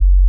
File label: G flat 4, pure tones